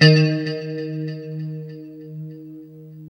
LO E D#3, Guitars; Spectrasonics; Hans Zimmer Guitars VOL-1; JC-SHEEN

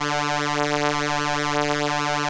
ELKA, String Machines; ELKA RHAP

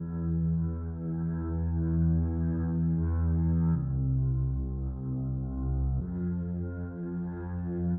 Synth Riff E-C, 120bpm, House; Construction Kits; Big Fish Audio; Old Skool House; OSH House of Amnesia

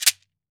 MARACA; AKAI S-Series CD-ROM Sound Library VOL-5; SHAKER.MARAC

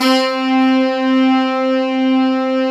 sax appeal C4; Synth

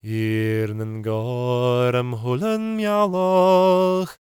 GAELIC, World Voices; ILIO; Vocal Planet

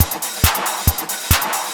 percussion loops 138; PS-45

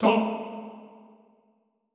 TONG A2, Extended Classical Choir; Best Service; SHORT TONG

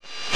NOISE CUT, percussive; Rarefaction; Poke in The Ear With A Sharp Stick

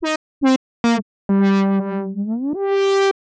Warbs 142C, uk garage samples, 142bpm, Synths

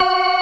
STOPS, Synth and Organ

